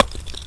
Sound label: brick bounces